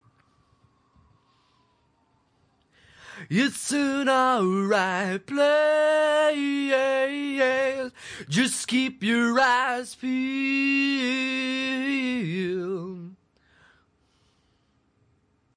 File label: male vocal, 180 bpm male acapella